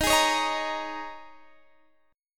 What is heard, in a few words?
D sharp 7th Suspended 4th, Guitar, Open D